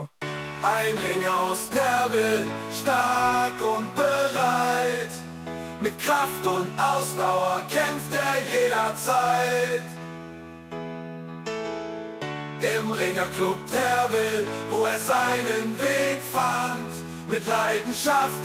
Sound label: Rap; Ringer-Club Rap CD